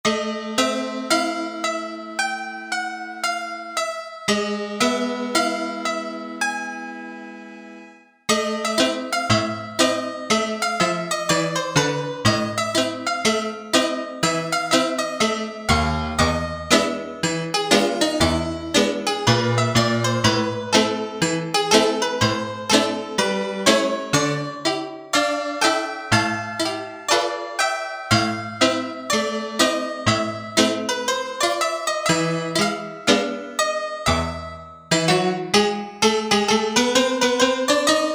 Toy Piano, Korg MSC-1S; Korg MSC-1S Variety

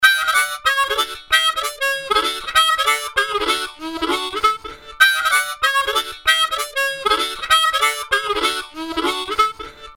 Blues Harmonica; Apple GarageBand 2; Apple Loops

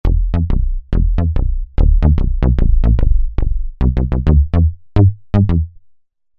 Short Bass, Roland V-Synth; Factory Patches

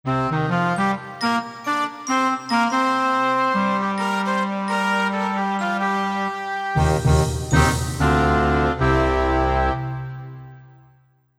Velo Winds

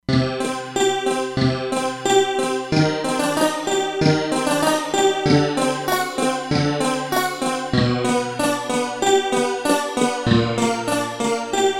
Cimbalom, E-mu Proteus-3; Factory patches

